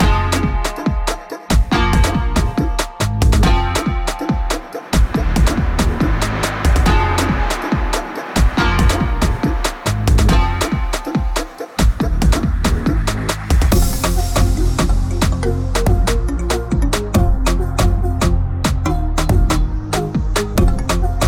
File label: hiphop; dark sound